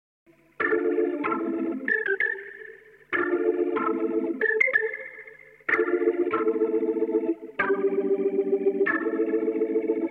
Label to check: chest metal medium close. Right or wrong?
Wrong